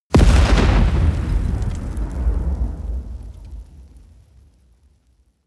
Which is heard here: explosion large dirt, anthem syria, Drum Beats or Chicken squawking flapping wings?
explosion large dirt